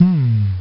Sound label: humm; BattleSNDS